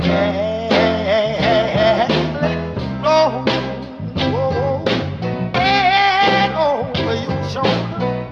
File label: full yeah ohh let go sucker, Loops Phonky